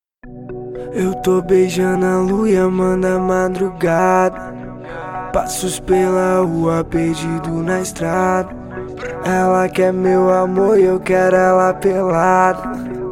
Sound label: rap house